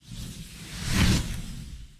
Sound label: Portal; Blind Quest - The Frost Demon